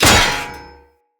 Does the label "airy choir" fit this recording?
No